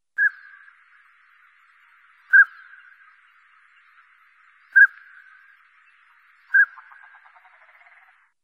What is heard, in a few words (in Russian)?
brachnye kriki samca